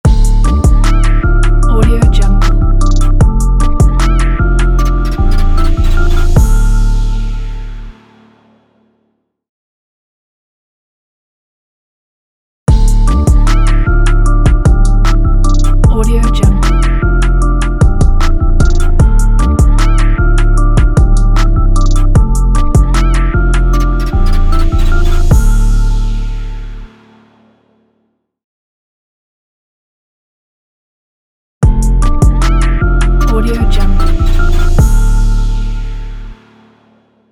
trap dark logo

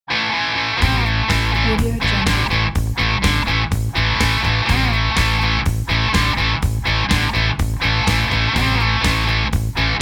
this is hard rock

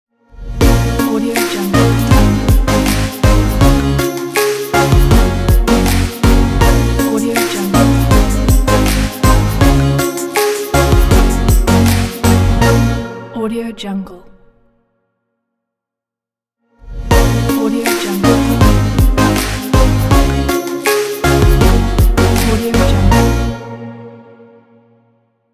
future bass logo; alex-productions

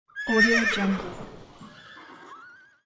horses cavalry sfx

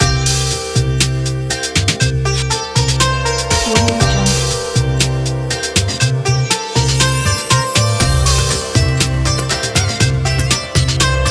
joyful indian intro

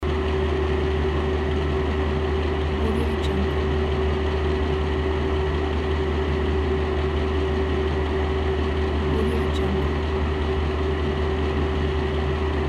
bathroom fan loop

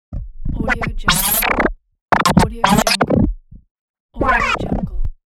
sci fi sound

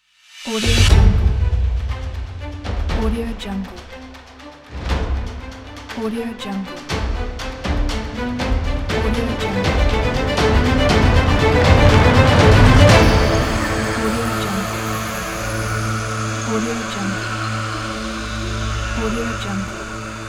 dangerous jungle logo

